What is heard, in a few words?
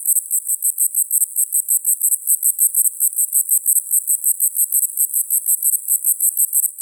Myopsalta albiventris; Carnarvon NP